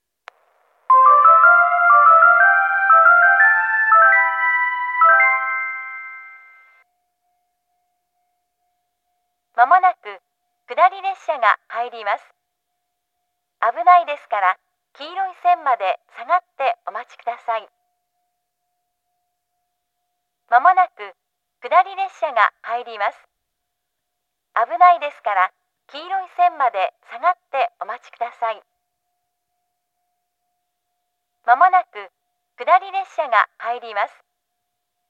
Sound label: shoden kudari sekkinn